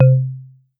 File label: Bounce Marimba; friction